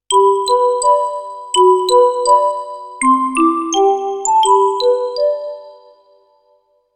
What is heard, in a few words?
happy holidays notification ringtone